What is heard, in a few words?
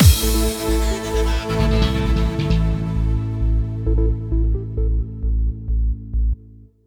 Funk Outro; Funklift